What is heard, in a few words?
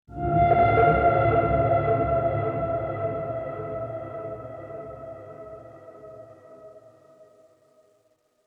tragic Ending